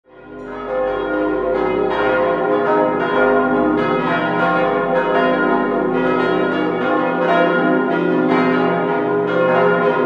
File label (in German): Bettbrunn, glocken